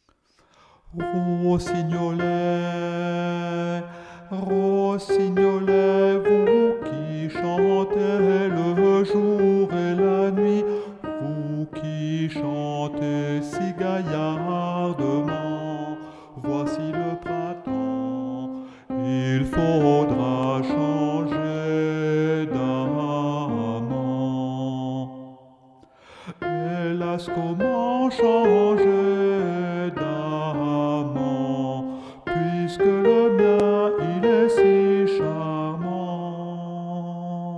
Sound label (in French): alto; ROSSIGNOLET (alto chanté)